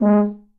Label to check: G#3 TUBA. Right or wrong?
Right